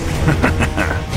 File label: laugh; freak fortress 2; armstrong